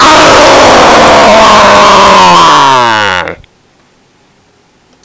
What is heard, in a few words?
jumpscare sound; FNAF